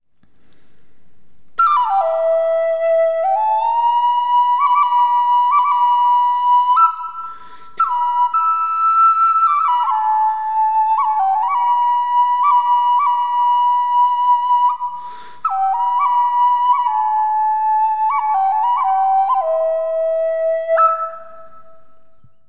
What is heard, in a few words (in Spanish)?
Palo rojo reverb, flauta en mi5 de 5 agujeros